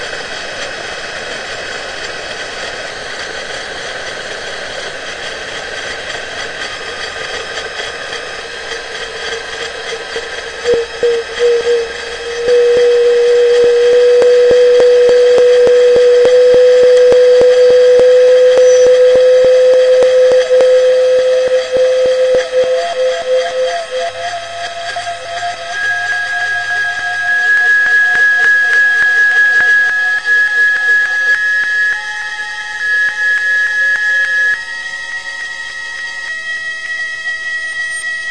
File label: hummin